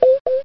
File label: Sonar Beeps, Cheesy Lo-Fi Sound Effects